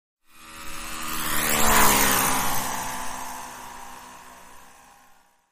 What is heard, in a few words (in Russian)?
skuter proehal